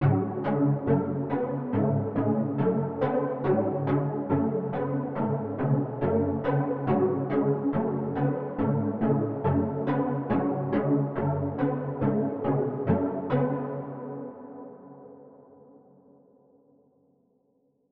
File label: LOOP